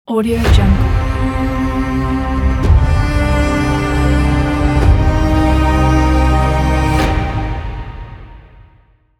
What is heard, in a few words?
a cinematic logo